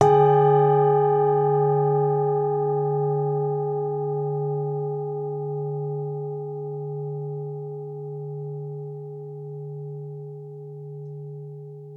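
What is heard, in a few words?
singing bowl soft; Klangschale Weich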